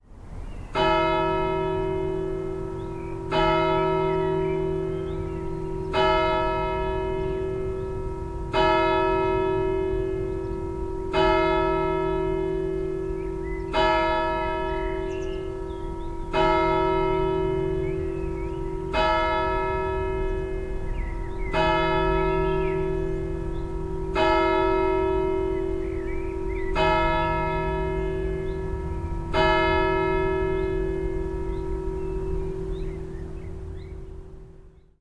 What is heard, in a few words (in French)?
tintement